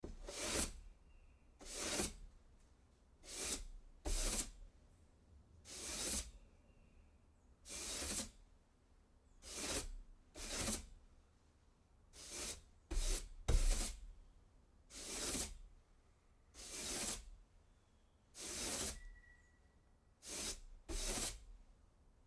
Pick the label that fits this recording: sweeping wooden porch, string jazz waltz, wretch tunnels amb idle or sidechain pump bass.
sweeping wooden porch